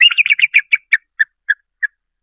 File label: bird